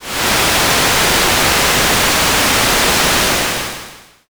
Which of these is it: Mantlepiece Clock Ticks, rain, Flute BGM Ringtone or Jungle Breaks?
rain